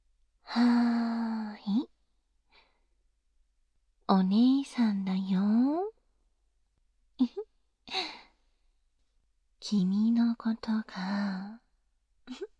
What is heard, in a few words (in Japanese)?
お姉さんの優甘耳舐め, ASMR